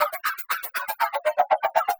120BPM LOOPS; Bolder Sounds - Granular

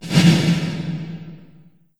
LH S808 -L, REVRB TR 9+8 LARGEHALL808

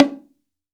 BONGO, STEREO BONGO, Cuba

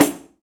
Pandeiro; Roland BK-5; Percussion

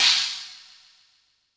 Chinese Cymbal

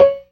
PERC, Synth and Organ; InVision Interactive; Keith Emerson; The Most Dangerous Synth and Organ; CLASSIC C3 4

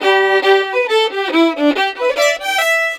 violin, Celtic Flavours; USB Soundscan vol.42; AKAI

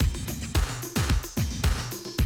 Classic DrumLoops, 110

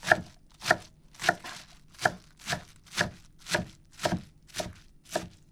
KITCHEN KNIFE; AKAI S6000 CD-ROM - Volume 6; Tools

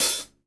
CLOSE, HI-HATS; Sampleheads; New York City Drumworks VOL-1; SP HI-HATS

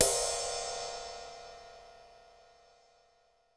New Brush Ride, Cymbals; Roland BK-5

